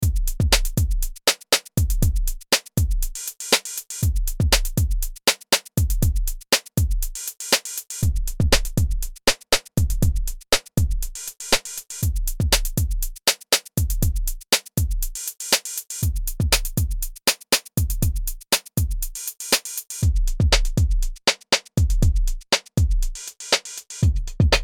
Drum

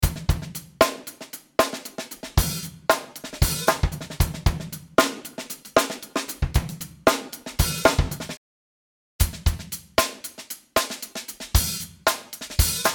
Drum Bus EQ

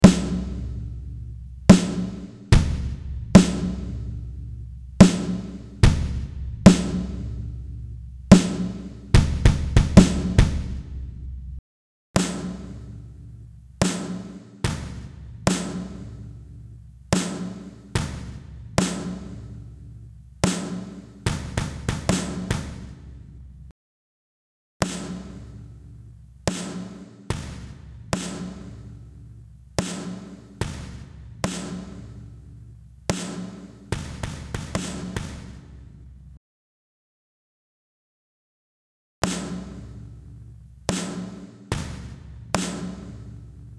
Bright Drum Comp

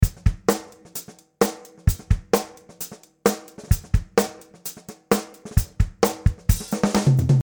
Drum

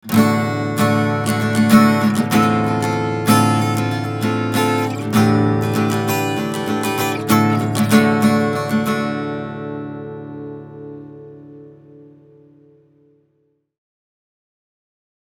Ac Gtr Short Plate Heavy; Mullard

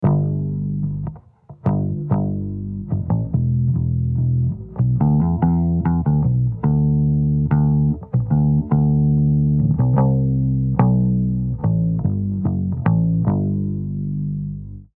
bass after

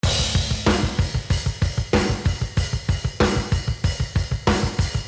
Drum After A Little More Room

